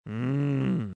moo

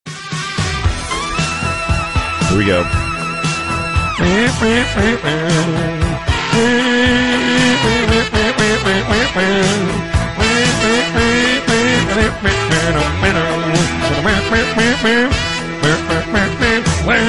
hums urgent sax solo

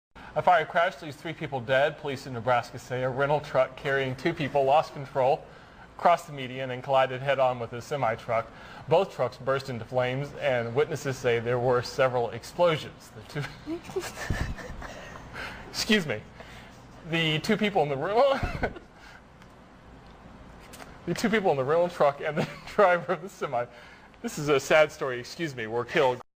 Anchor Laughs

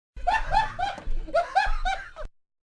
crazy laugh

